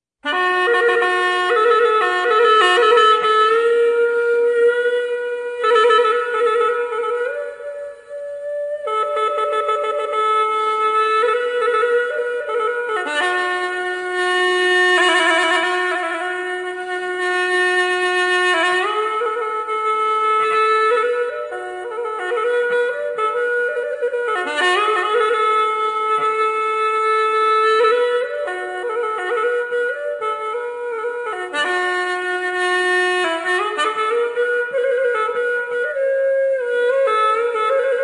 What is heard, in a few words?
Khmer music; pleng khmer